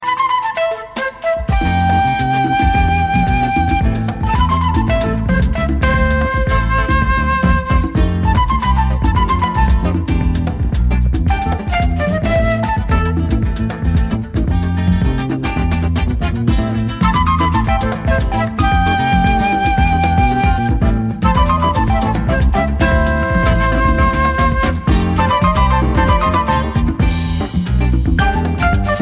jazz flute

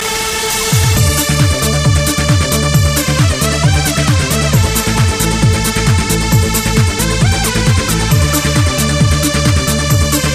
Acoustic

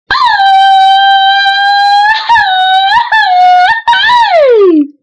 mexican yell; ringtones

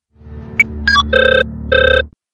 cell phone ring; ringtones

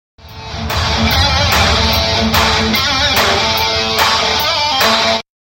guitar msg; ringtones; sms

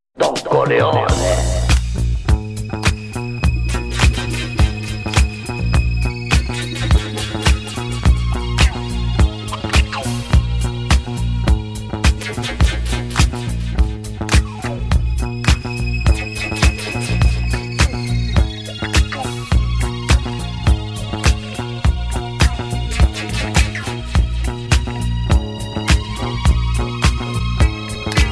funky

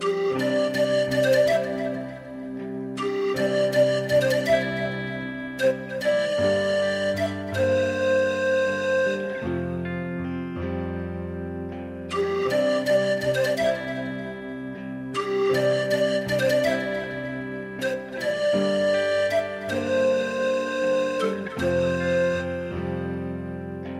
Theme Instrumental

